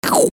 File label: human slurp